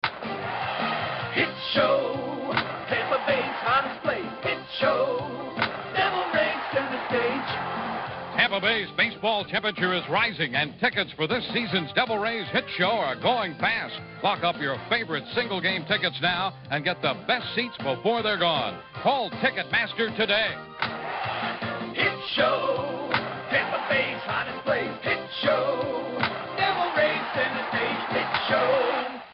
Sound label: radio jingle; Tampa Bay Devil Rays; Hit Show